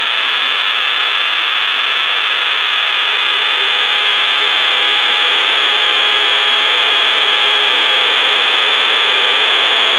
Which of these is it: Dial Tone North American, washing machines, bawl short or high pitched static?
high pitched static